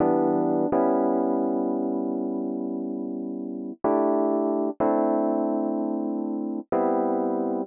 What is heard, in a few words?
125 Rhodes E, Keys, Nu Jazz House; PowerFX - Nu Jazz House